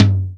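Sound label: SP-12, drum machine; Best Service Gigapack 2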